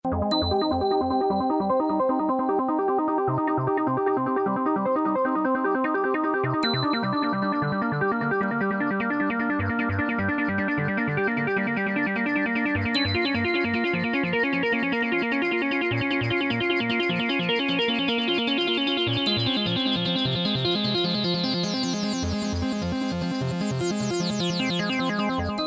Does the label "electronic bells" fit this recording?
Yes